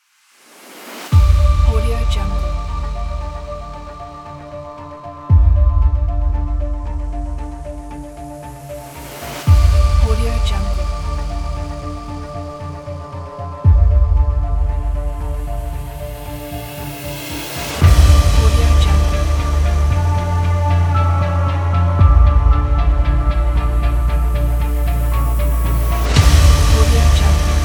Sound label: cinematic trailer